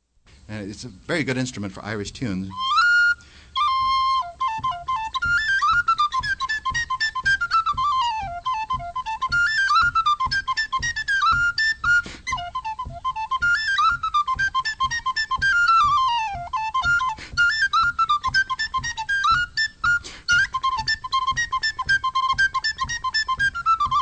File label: Irish Pennywhistle; 3rd Annual UCSD Mini Folk Festival